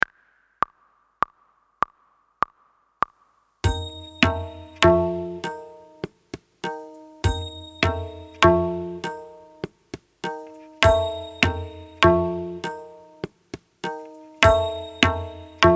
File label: Ta Practice with Na With Tabla